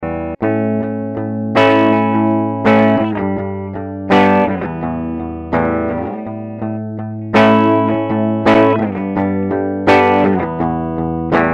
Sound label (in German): Boss EQ 200 Mid Scoop Verzerrt Pre EQ